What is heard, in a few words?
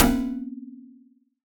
Alien FM Perc Med; Ableton; Noise Garden - Augmented Percussion; Alien FM Perc Vol. 1